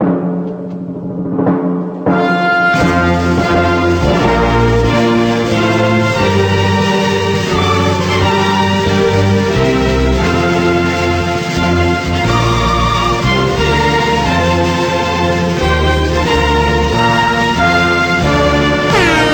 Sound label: RINGTONE